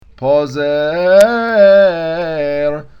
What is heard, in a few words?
pezar, cantilation